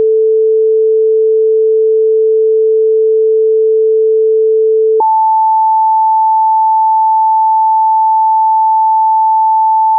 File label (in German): Frequenzen 440Hz 880Hz